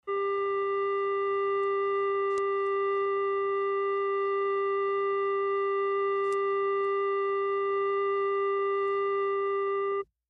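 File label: British Tel Number Unobt, futz busy signals; foreign futz busy signals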